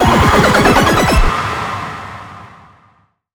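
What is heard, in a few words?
LEVEL WIN STING; high hell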